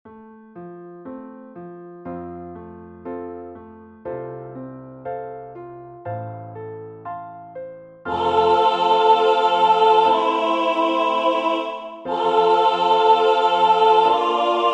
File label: alt sanctus